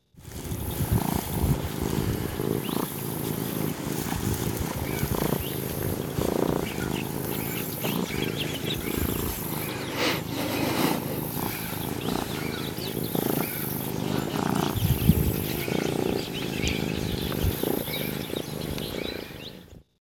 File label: serval purring; ingressive